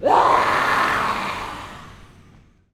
whuahrrrr

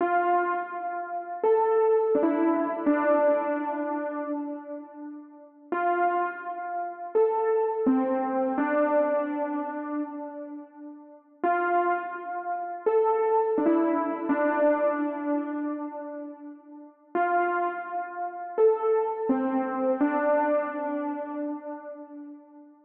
168 BPM D Min Lead; Melody Loops; Always Yours; Cymatics; ANALOG EVOLUTION Beta; RnB Collection

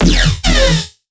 Riddim Bass Hit E Chainsaw; One-Shots; Bass Hits; Ghosthack; Riddim Essentials Volume 2

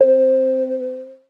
PLUCK (C), Pedal One Shots; Rose; Cymatics; ANALOG EVOLUTION Beta

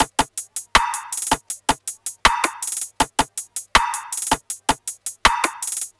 Top Drum Loop, 160 BPM, Hip Hop; Drums; Humble Hip Hop Sample Pack

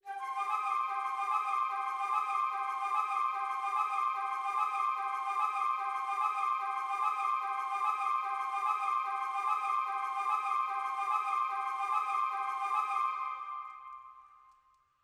147 BPM C Min Woodwinds; Melodies; Loop Stems; Cymatics; Saga; PHARAOH Beta Pack; Pharaoh Premium Drum Samples (BETA)

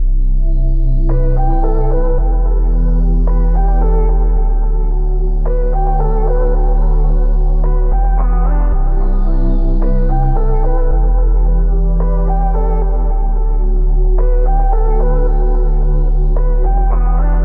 110 BPM E Min, Hip Hop Melodies R&B

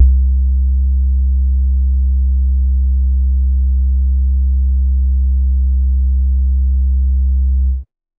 Synth Bass C, Lo-Fi One Shot; Melody Kits; Ghosthack; Memories 78 Em; HipHop

